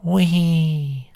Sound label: wehee